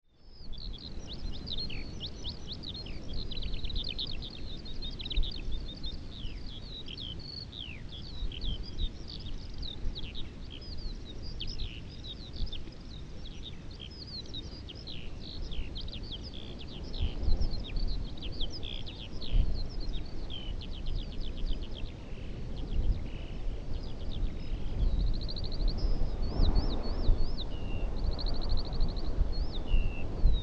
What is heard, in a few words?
Larks; Graig Fawr